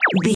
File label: ui menu button beep